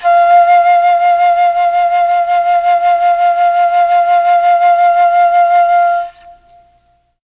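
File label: F4 chamberlin flute, mellotron; CHMBLN FLUTE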